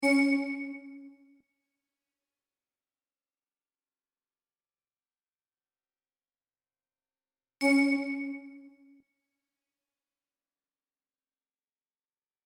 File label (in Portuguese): amostra de pulsos com periodicidade